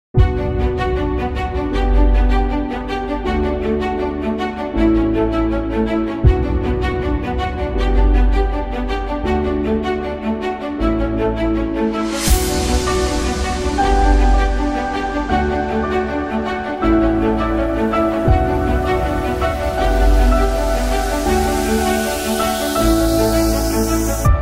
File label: SHORT EPIC INTRO